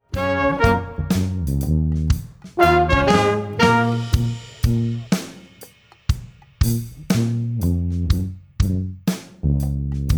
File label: Solo, brass